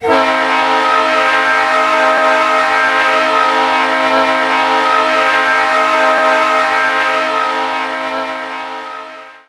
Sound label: rs3l, horn; run 8; ns pittsburgh line east horn pack